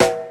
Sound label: tape dark snare drum b key; snare drum samples